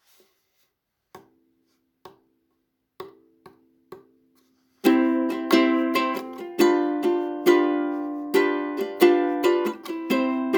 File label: Karaoke Version